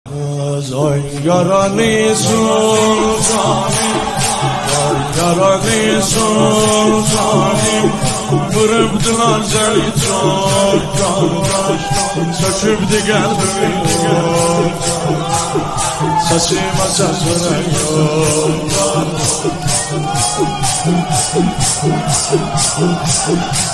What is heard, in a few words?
Turkish hymns